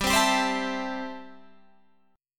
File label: A-Minor 7th G